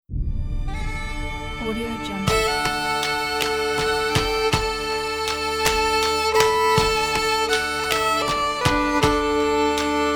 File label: celtic violin